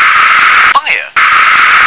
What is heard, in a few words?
fire